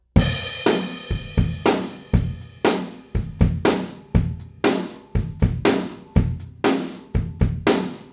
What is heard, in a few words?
drums retro 600 ohms; retro op6